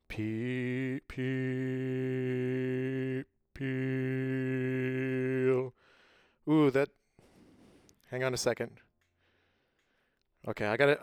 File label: vocal